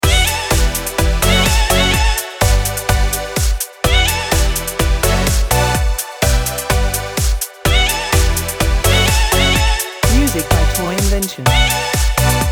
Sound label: Dance Night loop